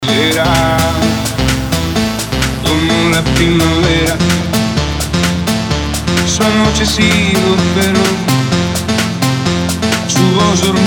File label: House Remix bpm 128